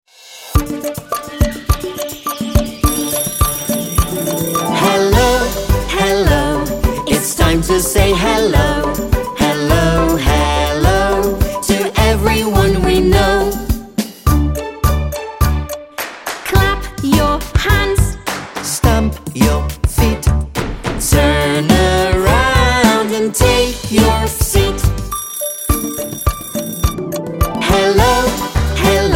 piosenka Hello Song; fresh english; teachers book

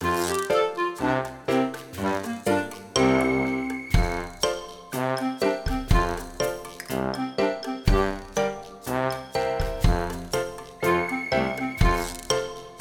plas bez wokalu

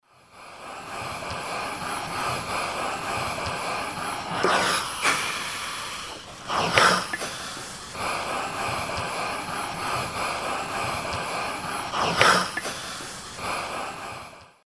zelazko; karty obrazkowe

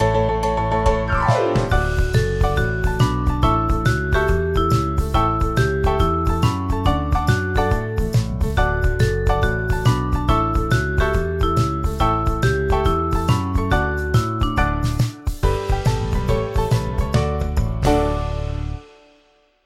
plas bez wokalu

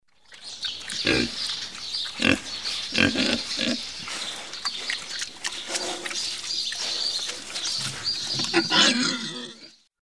swinia i prosie; karty obrazkowe; z kurnika do koszyka

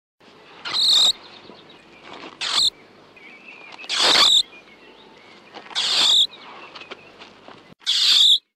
kopiec kreta glos; multigrafia

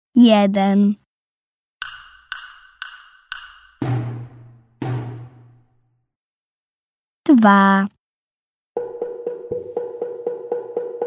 nagranie rytmów; szkola marzen na tak; klasa 1

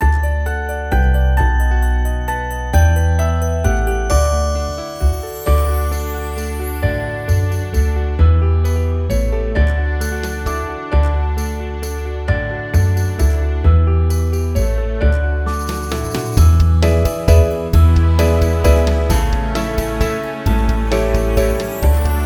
plas bez wokalu

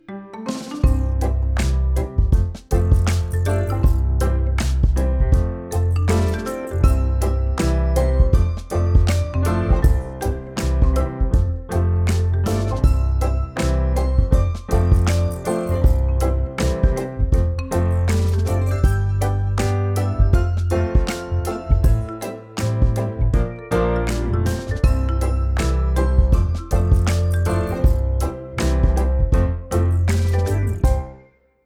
plas bez wokalu; szesciolatki; multiprzewodnik; akomp

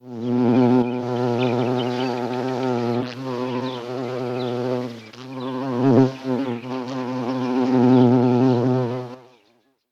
gniazdo os glos, odglosy; multigrafia

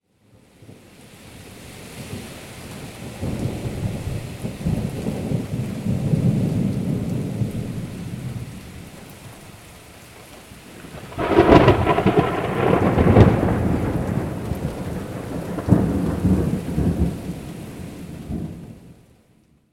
burza; kalendarz pogody

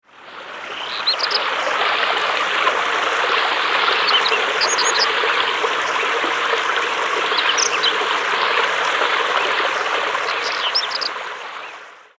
w gorach; karty obrazkowe; mala i duza ojczyzna

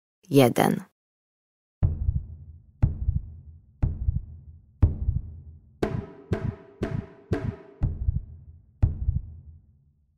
rytmy serca; szkola na tak

